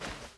fs carpet medium; stanley parable

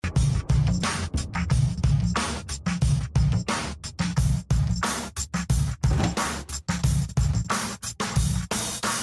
bg music; The Cat Lady

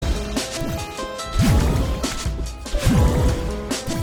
celeste sound dashing bubble

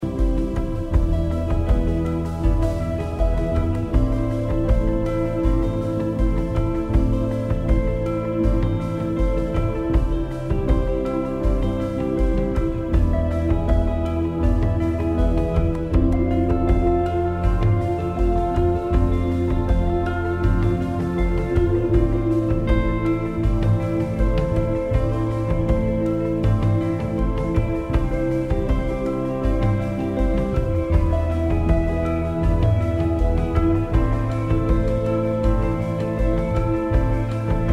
on the road walking music; Life is Strange 2; gamesoundopedia

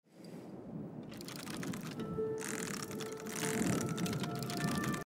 fan opening; gris

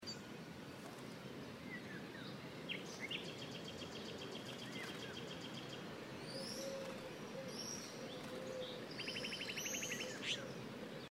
morning outside dorm; life is strange

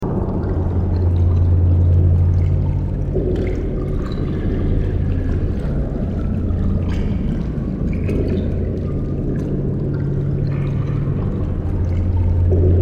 ambience water; portal 2; glados

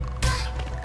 player getting damage; ori and the blind forest

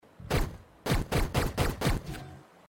normal pistol; hyper light drifter

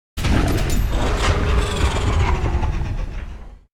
terran variation; starcraft2

